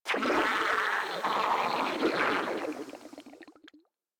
Frogman Death, Vocals; Warframe; Grineer

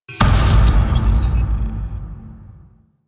Commander Killed Notification; Missions; Venus; Orb Heist; Bounty Two

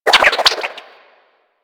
Grapple Rope By

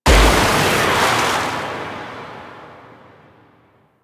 Pirate Cannon Blast; Warframe; Warframes

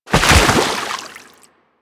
Roll Forward Foley Water E; Enemies; Warframe; Teshin; DE

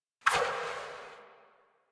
Omicrus Quick Draw Tail; Warframe; Quests; The New War; Weapons

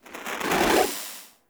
Syndicates Trans In, UI; DE; Warframe

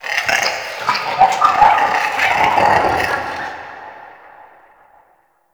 Under City Medium Creature Chatter

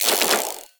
Igniter Pistol Reload; Warframe; Weapons; Corpus

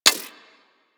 Bullet Impact Ricochet; Warframe; Weapons

